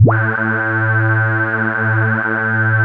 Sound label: CHUNKY A3, Drums; Drums In Your FACE!